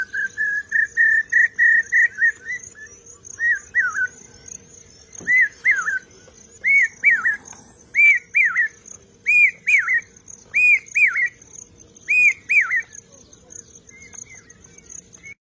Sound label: ringtone